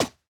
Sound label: Player Footstep; gamerip; escape from russia mobilization